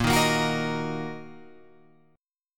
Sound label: Bb Suspended 4th Sharp 5th Guitar Open F, Bbsus4#5 chord; Bbsus4#5 strummed